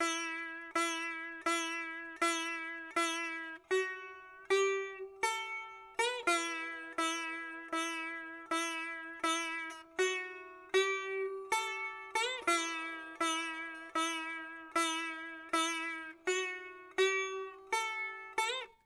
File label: sitar